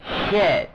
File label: msx; hero-mark-2